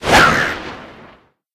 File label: Bird Red Charge; Angry Birds